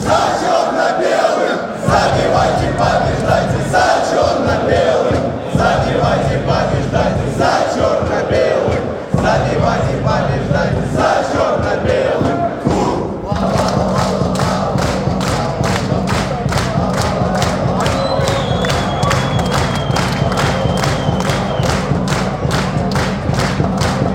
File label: za cherno belykh fanchants, football songs, fc torpedo moscow chants